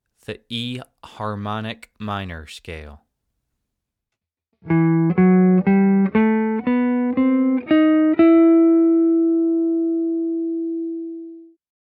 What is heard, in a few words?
E Harmonic Minor